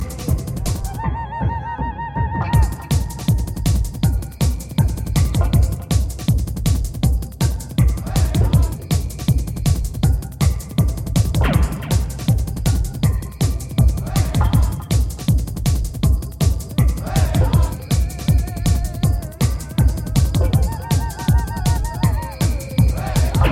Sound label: indian bass mix